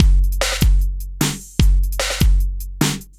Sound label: V Drum Loop; Sonic Foundry (Sony Creative Software); Electronic Point Blank; Drums & Percussion